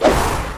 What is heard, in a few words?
sword swing; weapons; dota; dragon knight